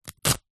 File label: foley fabric towel rip